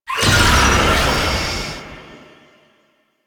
Unyielding Gallop Cast; Warframe; Dagath

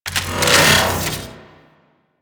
Shield Equip; Warframe; Weapons; Archwing; Melee; Arch Sword Shield

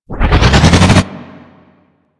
Hijack ATV Startup; Warframe; Corpus Ice Planet

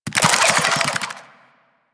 Infested Big Ashen Melee End; Warframe

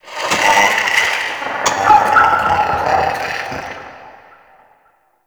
Medium Creature Chatter; Ambience; Dungeons; Under City

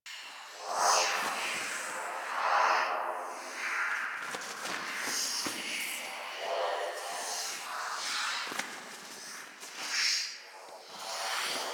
Wisp Move Loop; Warframe

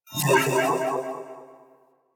Iron Shield Strip Attach Enemy; Warframe; Warframes

